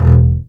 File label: BASS MART E1; AKAI S-Series CD-ROM Sound Library VOL-2